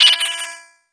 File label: counter